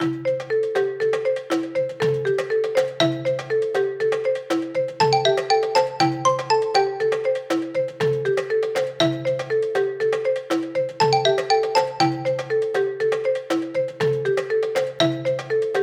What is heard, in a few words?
tutti